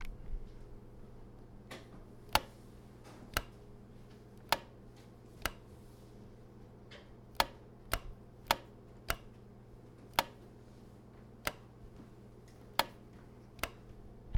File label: Lightswitch